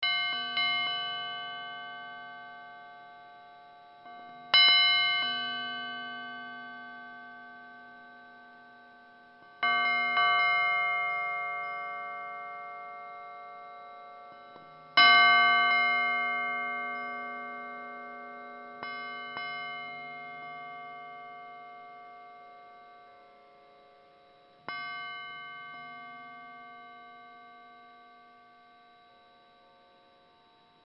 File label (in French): ralenti moins deux octaves; L'INDICATEUR N°1